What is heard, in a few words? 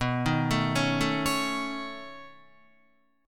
B 9th B down Guitar; B9 arpeggiated; JGuitar